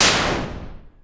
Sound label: Shoot, Retro Games Sound FX